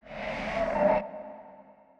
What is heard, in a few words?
Noise Sting; musicradar